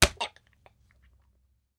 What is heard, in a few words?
Impact Human Grab Throat And Choke Short